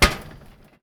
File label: metal grate, player footsteps